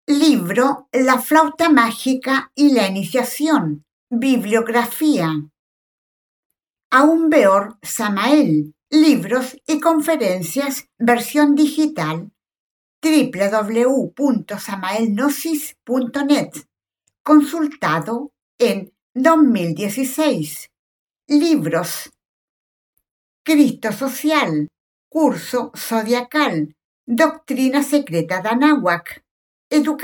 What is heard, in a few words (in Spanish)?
flauta